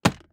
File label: Transportation Jeep Commander Close Console